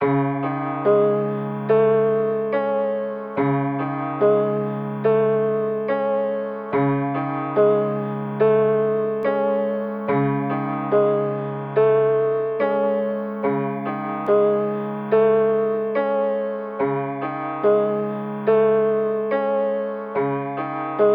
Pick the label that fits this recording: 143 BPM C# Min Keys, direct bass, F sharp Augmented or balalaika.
143 BPM C# Min Keys